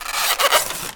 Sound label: Squeak Styrofoam; SFX Kit